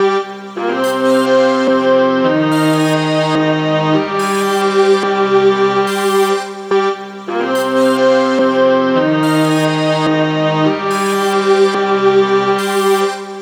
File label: Strings 143bpm Cm Cello Shape Trap